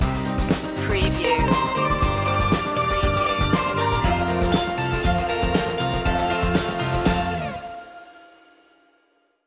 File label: full sails stinger